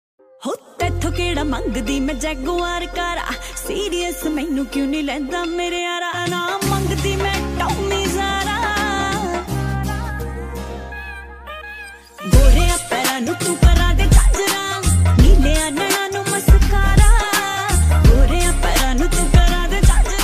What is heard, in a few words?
Punjabi Tune Ringtone